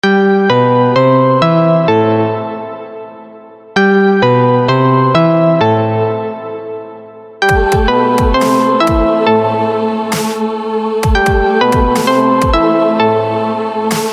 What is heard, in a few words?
trance